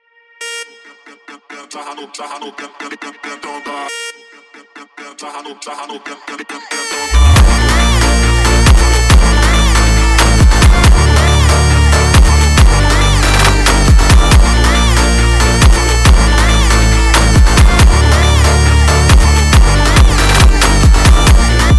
Funk sped Up

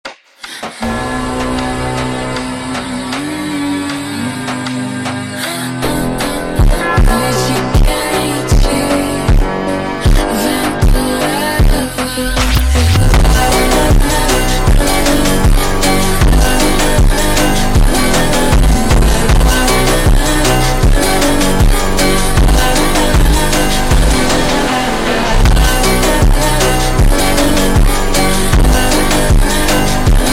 Funk, Ultra Slowed

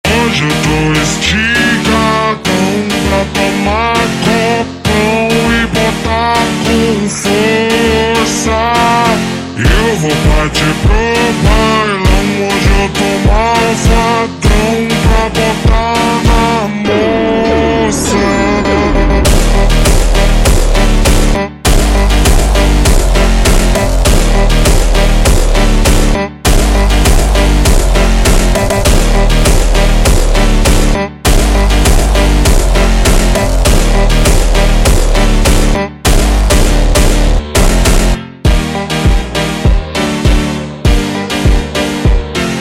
Jumpstyle ultra Slowed

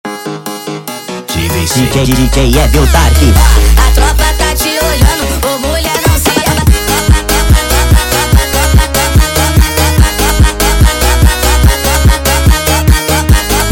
Funk Zuado sped Up

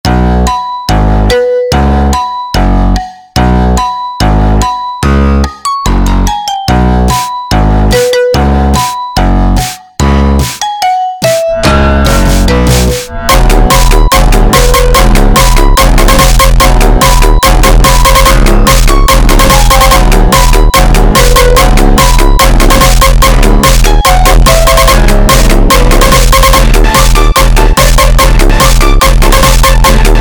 Hardtekk, funk

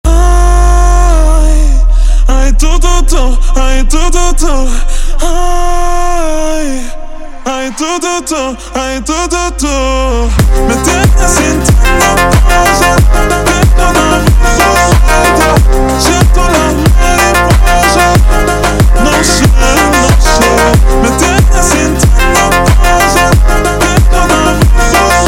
super Slowed, فانک; funk

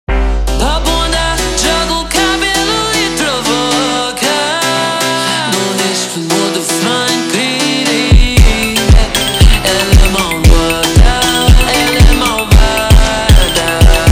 slowed, فانک

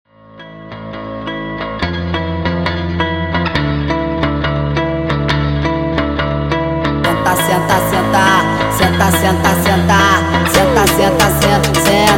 Funk speed Up Version

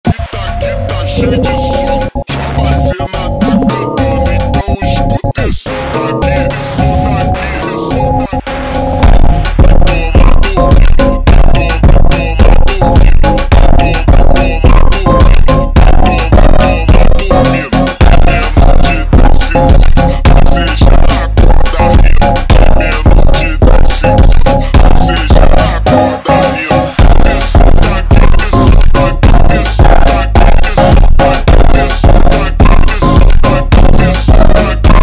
Funk slowed

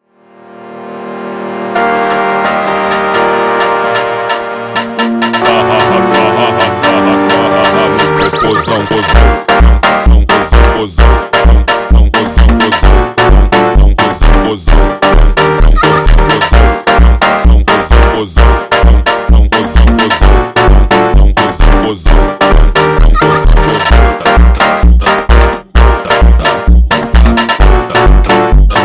Funk

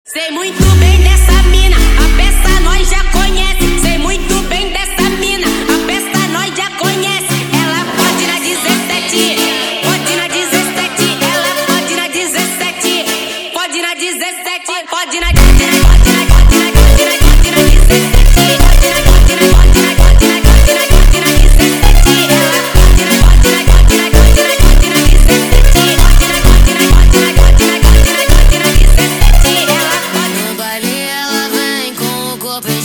Ultimate Aura Funk

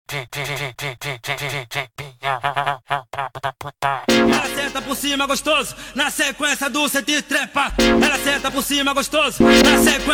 Montagem Vozes Grito, funk

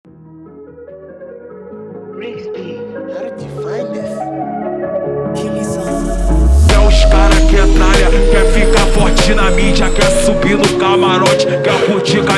Brega slowed; funk

